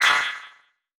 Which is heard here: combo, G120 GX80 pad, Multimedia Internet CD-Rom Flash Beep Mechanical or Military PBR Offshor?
Multimedia Internet CD-Rom Flash Beep Mechanical